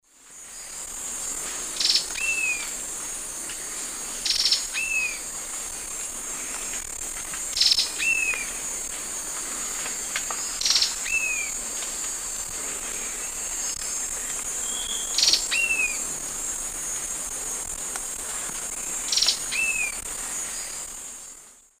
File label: Myrmeciza atrothorax, calls; S. America; Eastern Lowlands